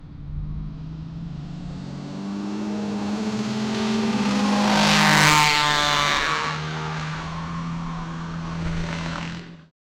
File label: motorcycle speeding and a, highway